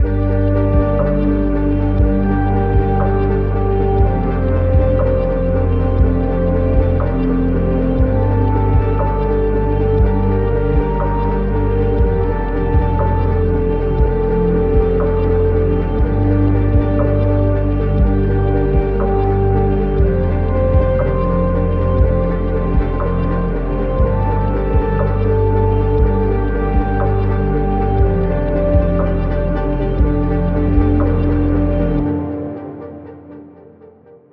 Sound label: Ambient; Ambient Music Pack Vol. 11; Cultivate